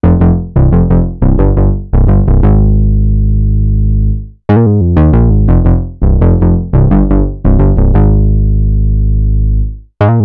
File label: Synth loop 87 F, Synth Bass Loops; Slate Digital BASSHOLE Sample Pack